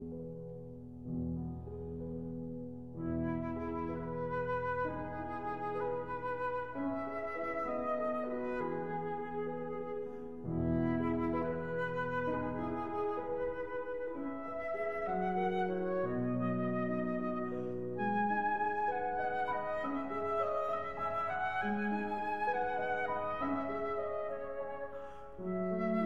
Berceuse (Lullaby) for flute and piano

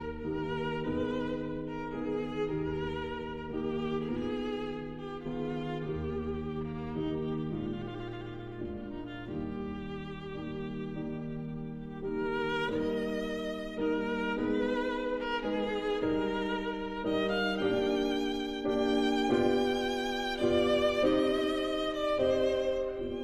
Sicilienne for violin and piano

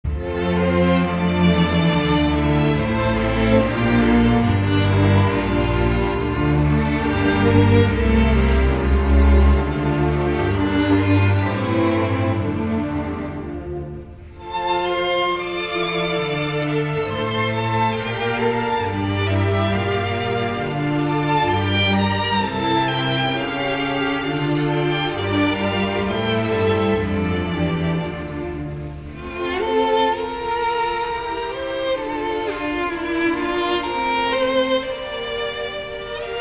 Air for Violin and String Orchestra; Cowell, Henry

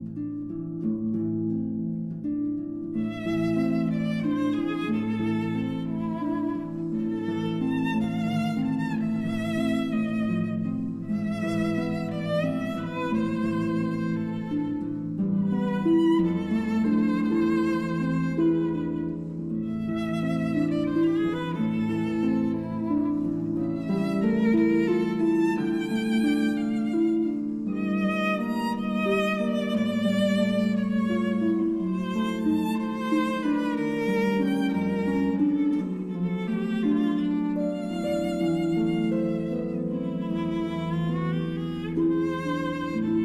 Berceuse, for violin and harp

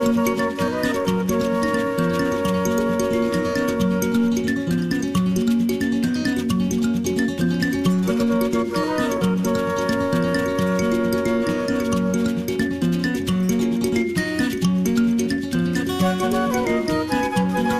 Traditional (Luganda)

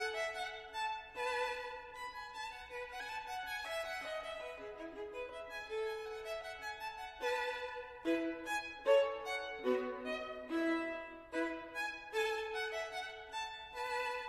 Gavotte en rondeau, Violin

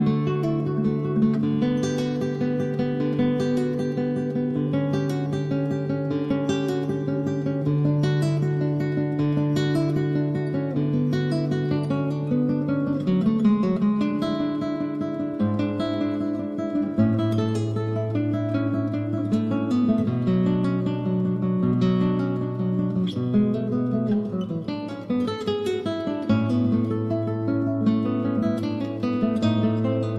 Cello Suite No. 1- Prelude

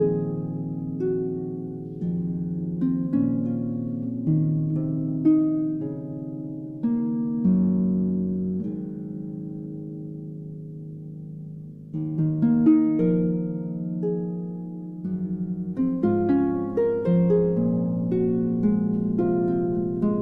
Harp Concerto Lullaby

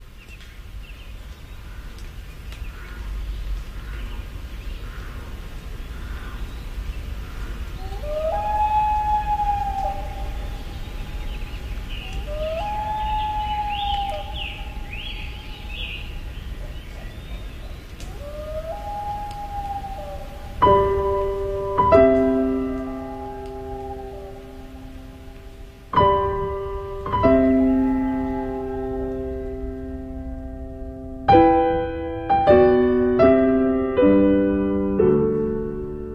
Theme, Loons piano

